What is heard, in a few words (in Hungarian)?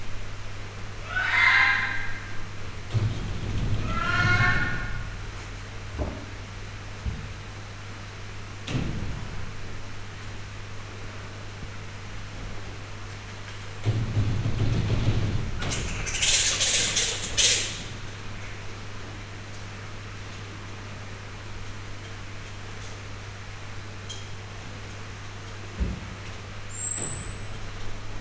indiai repülőkutya; nyiregyhazi zoo 2010